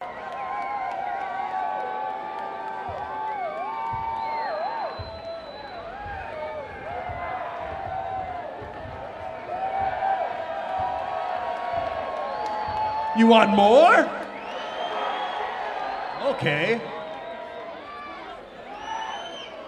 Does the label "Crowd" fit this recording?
Yes